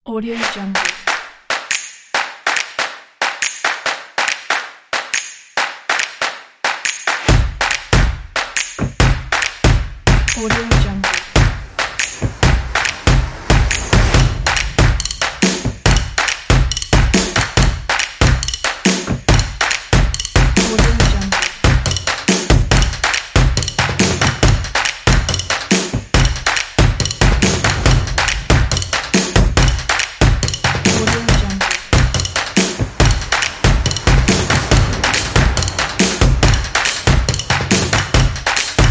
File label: cinematic percussion